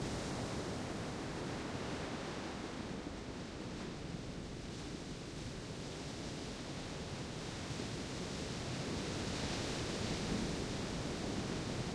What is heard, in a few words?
beach; portlux